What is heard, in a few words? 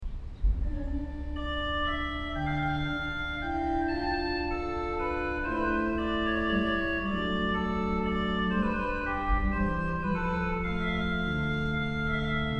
Organ